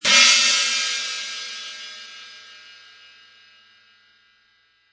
Crash, Crash cymbal loop at 110bpm.; Drum Loops

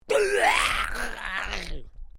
Created creature small yech; Created creatures, vocalisations and pretend speech; Aliens; SoundEffects Foundation2

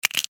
Insect, Claw, Grab, Snap, Movement, Bug; Animals:Insects; Insect Claws; Blastwave

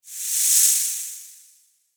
Animal Snake Hiss; Snake Sound Effects; Snakes (Sound Effects:Animals); SFX Bible 6

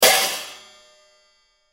High Hat Broken, High Hat Broken Hit; Cymbal Sound Effects; Blastwave

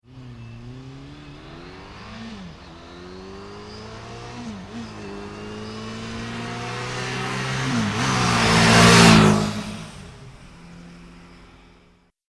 Motorbike Suzuki 650 Pass Fast; Motorcycle; Tom Hutchings

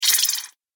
Rapid Insectoid Sound; Alien Sound Effects

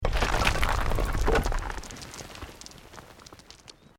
ROCK DIRT SLIDE SMALL; Created dramatic effects of buildings collapsing or breaking; Rocks; Materials

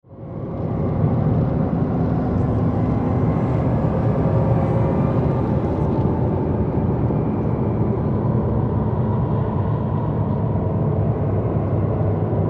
Horror Drone Screams Nightmare Voices Vocal; Scary Tone Sound Effects; Blastwave

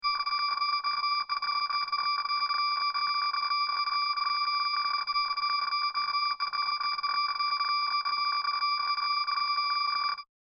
SciFi Scanner; Communication; Perfect for general Science Fiction usage or Multimedia usage; Sci-Fi Scanner Sound Effect; CraftedSignals

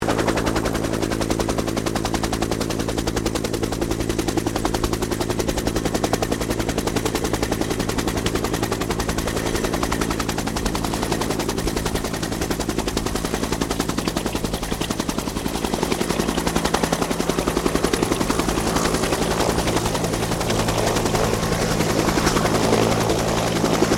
HELICOPTER 1967 BELL UH1 IROQUIOS TAKEOFF; RAN UH-1 Iroguios; Vehicles; Aircraft; Helicopter Sound Effects; Helicopters (Sound Effects:Vehicles)

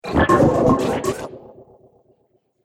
Science Fiction Laser Underwater; Sci-Fi Laser Sound Effect; Science Fiction Laser - Underwater Future

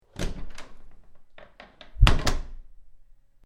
Open Close Wood Door; Door Closing; Doors

